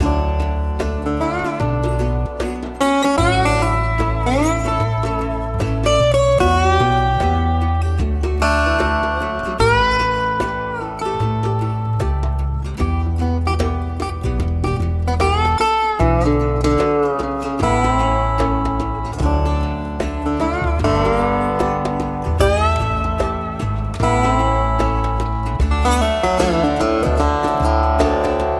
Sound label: Smokey Mountain Stroll With Slide Guitar 75 bpm